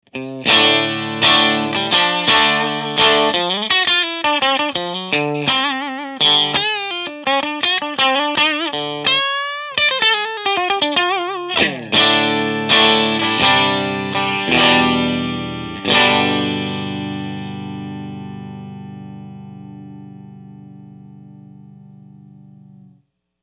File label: AC30 Blues